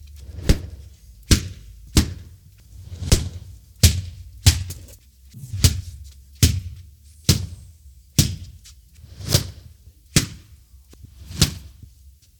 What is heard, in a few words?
Punches And Slaps